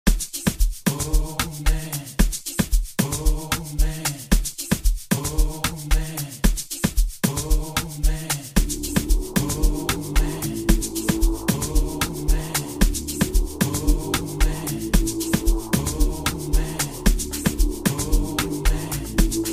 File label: Piano Cut